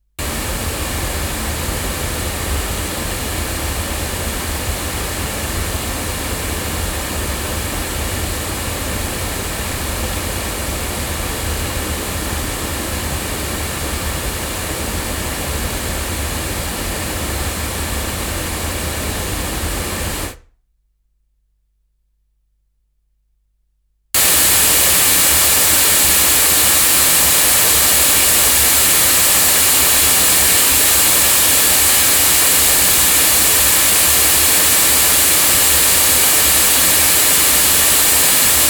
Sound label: loudspeakers; microphones; violet designs